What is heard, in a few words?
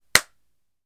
hand clap single hits